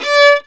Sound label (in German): GEIGE, Alpine Volksmusik